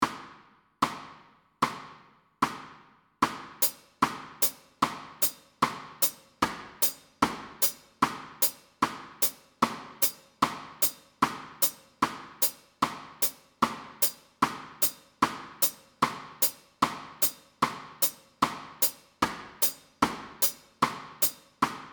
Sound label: offbeat